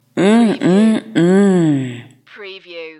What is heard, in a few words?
Fem MMMM oan